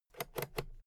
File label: fader slide click quick